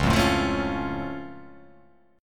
C sharp Augmented down, guitar chord

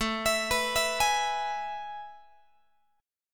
A Major 7th Suspended 2nd, Guitar; AM7sus2; arpeggiated; x,17,19,21,20,19

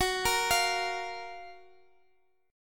F sharp Major 7th, Guitar Drop D; chordshape; down

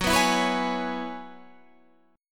F sharp 7th Suspended 2nd Suspended 4th, down; strummed; F#7sus2sus4

